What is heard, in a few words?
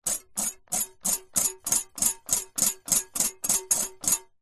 food whisk metal whisking eggs in glass bowl